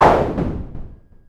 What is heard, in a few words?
Machine, Retro Games Sound FX